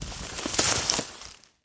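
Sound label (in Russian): тревожное нервозное шуршание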